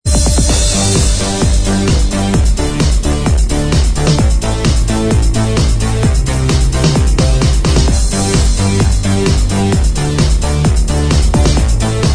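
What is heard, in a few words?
dance remix instrumental Track, backing tracks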